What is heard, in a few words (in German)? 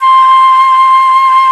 FLOTE SOFT